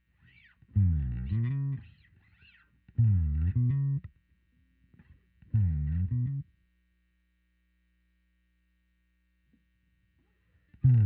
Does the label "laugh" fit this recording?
No